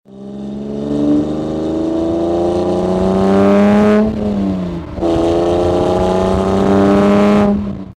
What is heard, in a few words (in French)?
Bruit du Motor; Jannarelly